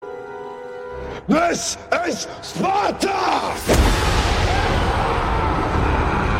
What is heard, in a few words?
messenger screaming; this is sparta